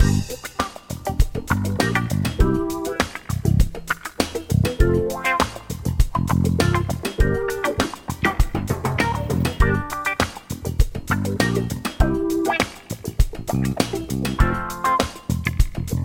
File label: solo loop